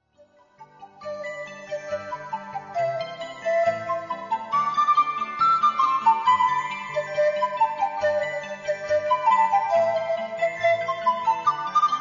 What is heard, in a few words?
jingle bells; USA